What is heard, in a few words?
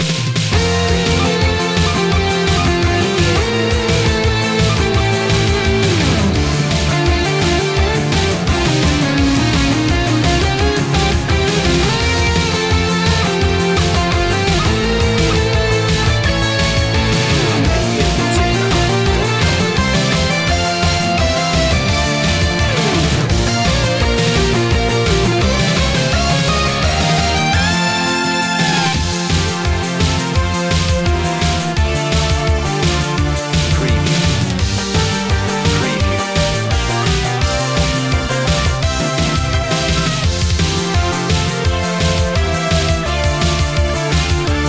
j-pop victory long looping